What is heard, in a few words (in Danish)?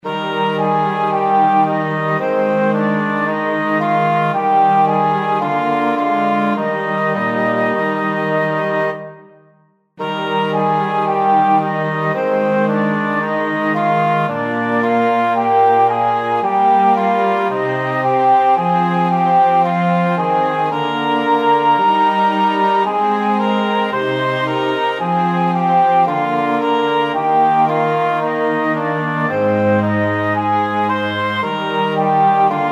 Instrumenter, Eb dur, 3 stemmer